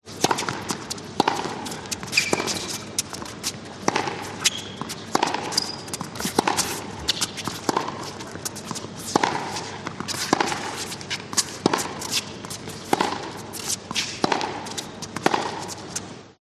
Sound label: sport tennis match rally pro